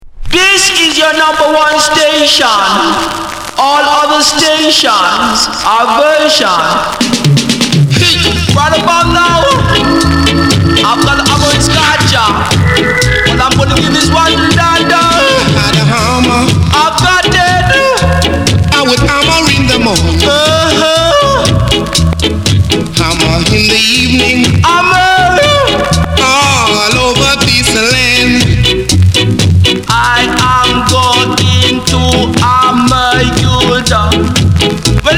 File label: VERSION